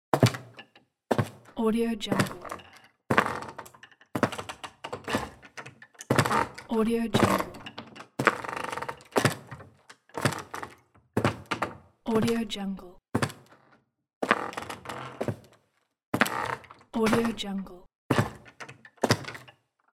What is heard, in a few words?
footsteps on creaky wood floor